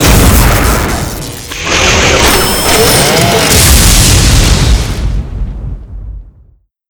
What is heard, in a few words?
Die; ArkAstanica; Gamigo